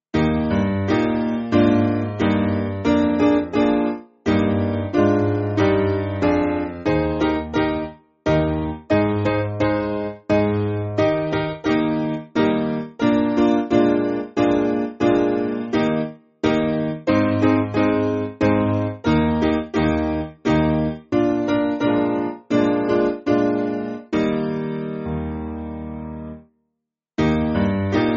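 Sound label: Piano, Music Backing Files for Churches; If On A Quiet Sea; Selvin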